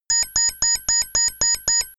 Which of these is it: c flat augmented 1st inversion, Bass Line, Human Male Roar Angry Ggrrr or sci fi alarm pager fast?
sci fi alarm pager fast